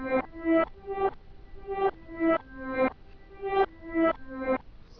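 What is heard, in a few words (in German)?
klavier rückwärts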